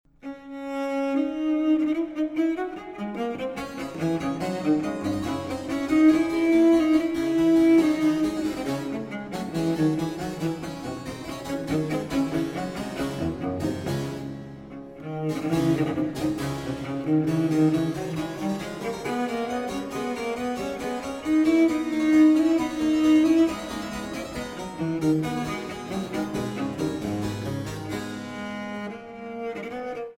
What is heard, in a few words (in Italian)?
Sonata III a violoncello solo; Domenico Silvio Passionei Sonata III a violoncello solo e basso continuo en re menor: I. Adagio; arcantus Musikproduktion; El Galeón